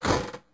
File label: chest open; Crypt of the NecroDancer